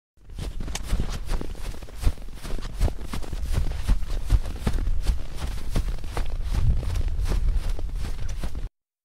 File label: Running steps on the snow